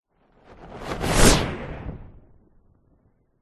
superhero fly by fast whoosh cape; zapsplat

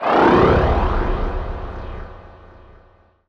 Transition Morph; bottlerocket

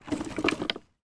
wood panel movement; foley

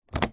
foley flightcase metal latches both lock

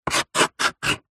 office chalk draw shape on blackboard

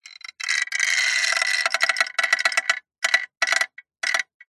metal stress creak thin tone; zapsplat

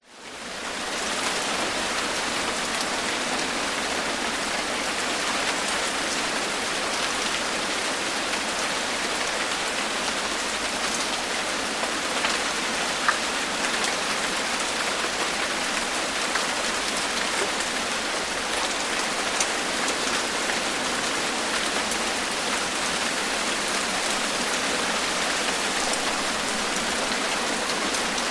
nature rain med light sub tropical; zapsplat

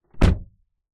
vehicles car door shut hard internal; zapsplat

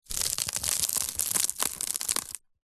basket movement stress; foley; zapsplat

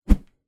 warfare weapon axe large object swing swoosh; zapsplat

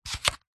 household phone charger wireless slide open; zapsplat

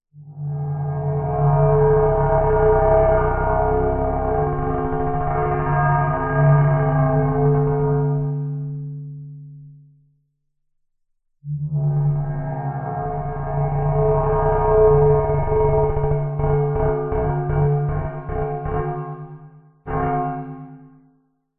sound design glassy low pitched roll rub weird

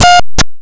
science fiction radio interference glitch

